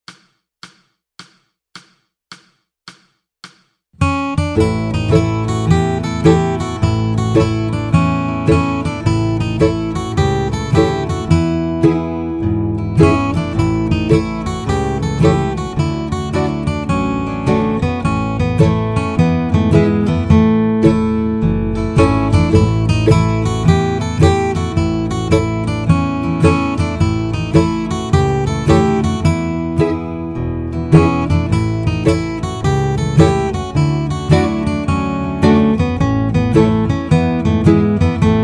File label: Slow Guitar; Old Joe Clark